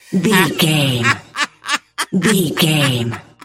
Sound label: voice male laugh high long